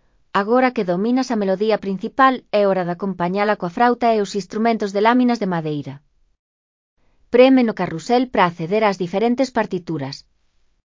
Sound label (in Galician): SUMALLE INSTRUMENTOS; A nosa zarzuela